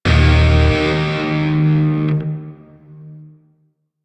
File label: Shawzin Pent Min Chord E; Grineer; Warframe